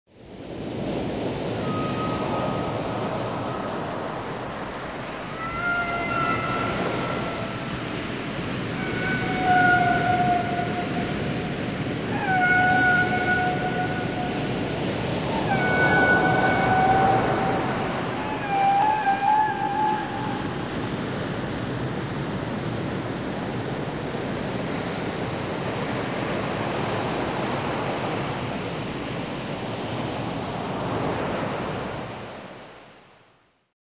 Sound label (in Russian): kity